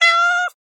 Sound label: cat meowing